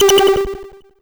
loop

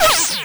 error

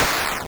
soft, explosions

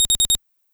alarm loop

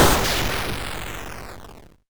medium, explosions

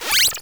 button

